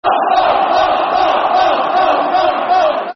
Off, Football Chants; West Bromwich Albion Wba; fanchants

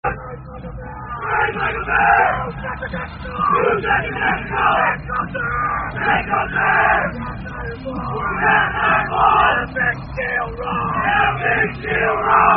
Chant, Rugby; Born In The Woods; Raised By A Bear Chant

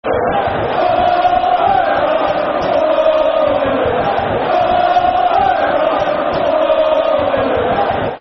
Ormerod Football Chant (Soccer Songs); Preston North End Pne

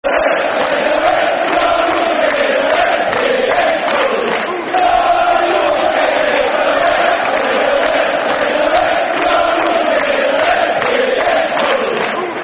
Hates Geordies, Football Chants; Middlesbrough; Jonathan Woodgate; Middlesbrough Mfc

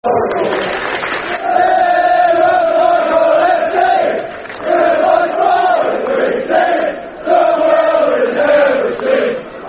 Plymouth Argyle F.c., Football Chants; Plymouth Argyle Pafc

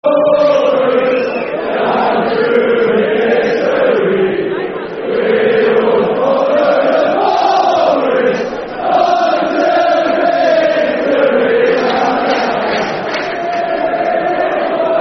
We All Follow The Forest Football Chant; Nottingham Forest Nffc

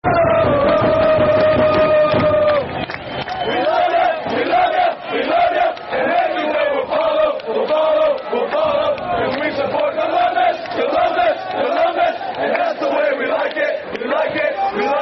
We Love Ya Football Chant (Soccer Song); Columbus Crew CCFC

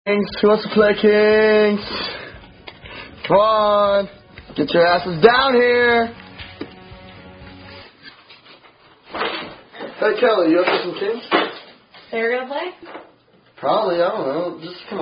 drinking game; Never Have I Ever

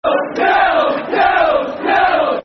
Yellows Football Chant (Soccer Songs); Preston North End Pne

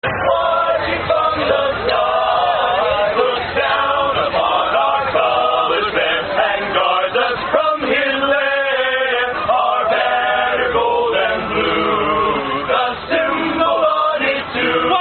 California Drinking Song; Cal Drinking Song At The Farm; Carry Harry To The Ferry And God Damned Dut

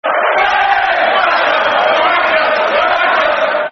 Football Chants (Soccer Songs); Portsmouth; Ben Thatcher; Portsmouth Pfc

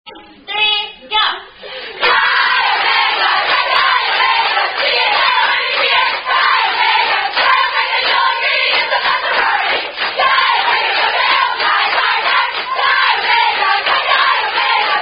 Two Sorority Song Chants

